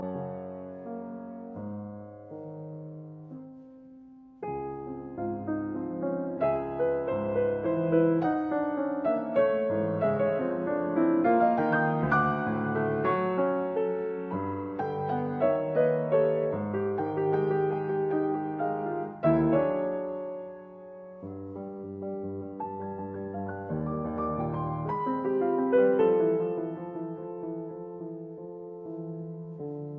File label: Piano Sonata No 3 Sonata Brahmsiana II Andante tranquillo